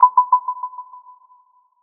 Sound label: Alarm; Alarms, Beeps